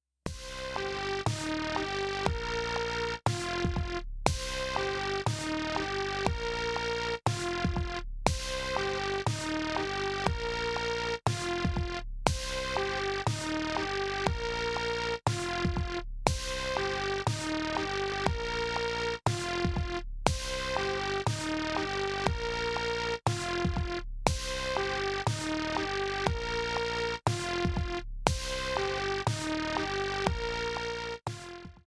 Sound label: own beat